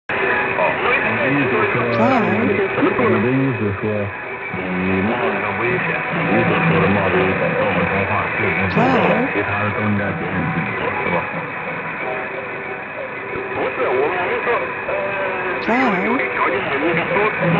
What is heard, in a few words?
DRM firedrake